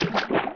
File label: land in water; Open Zelda; Quest Designer 2.0.4b; Dungeon